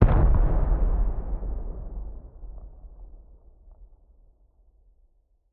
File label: rocket explosion distant; Rust